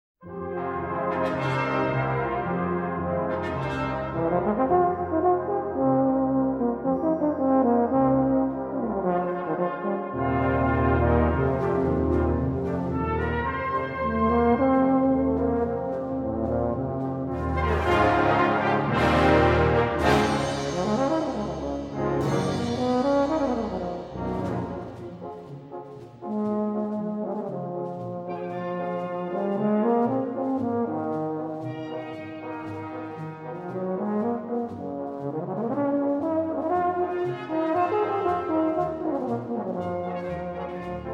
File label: Symphonic Rhapsody For Euphonium And Band